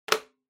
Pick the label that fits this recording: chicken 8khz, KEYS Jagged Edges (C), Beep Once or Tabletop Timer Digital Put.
Tabletop Timer Digital Put